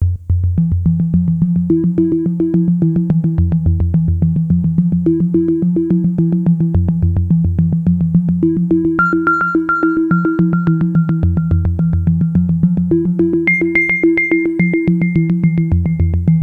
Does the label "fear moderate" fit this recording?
No